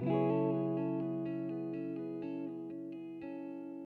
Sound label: Riff, Guitar; Kool Aid; TRUTH-HZ; Crowdsourced Sample Pack